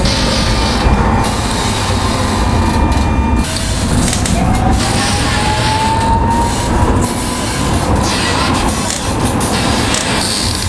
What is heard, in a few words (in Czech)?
311 vrzání; plzen